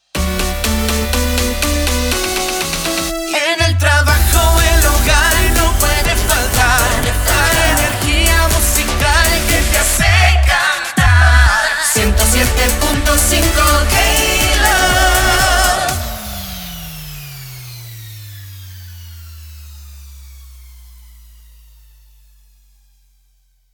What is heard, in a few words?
Sung Jingle, 122bpm, D Major, SLOGAN; KLove